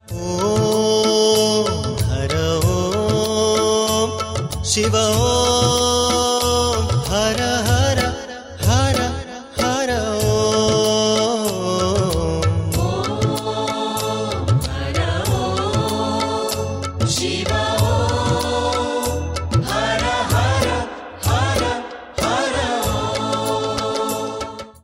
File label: Om Har Om Shiv Om